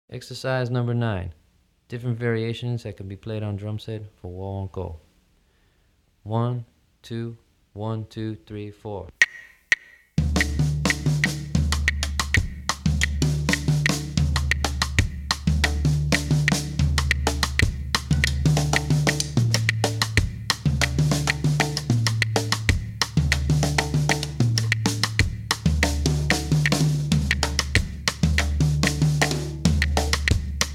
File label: Guaguanco Variations for Drumset